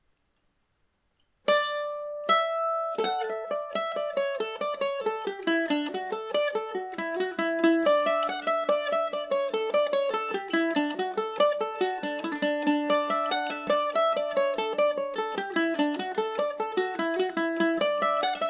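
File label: D major, irish mandolin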